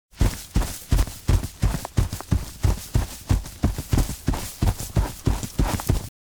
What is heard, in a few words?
steps run; anemoi